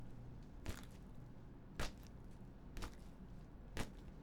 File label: Slow steps on rock